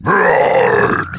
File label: bowser; mario kart wii